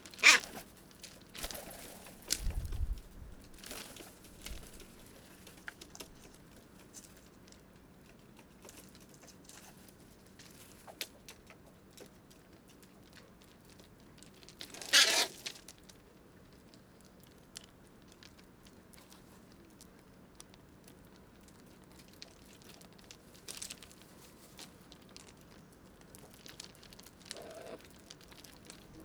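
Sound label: hooded crow corvus cornix cornix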